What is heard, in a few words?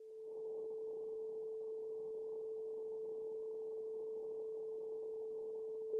sine 440Hz